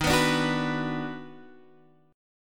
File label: D sharp Minor Major 7th Sharp 5th, Guitar Standard; strummed